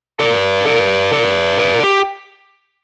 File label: guitar choking group